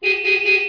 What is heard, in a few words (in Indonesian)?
suara mobil